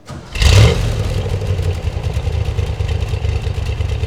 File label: 65 Corvette Start Loop; Automobiles